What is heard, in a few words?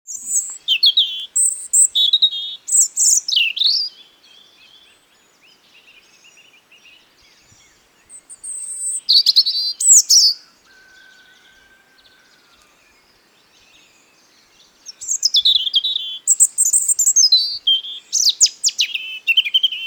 Erithacus rubecula; SETE